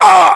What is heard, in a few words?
pain, UK, voiceovers